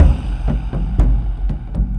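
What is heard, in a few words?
Music Shield; From Dust; PS3; Xbox 360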